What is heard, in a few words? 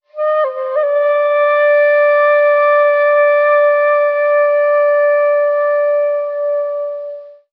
flute, Loops, Sad